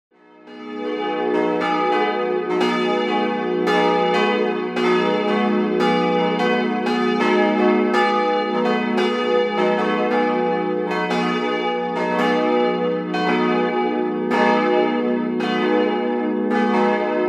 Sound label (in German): Glocken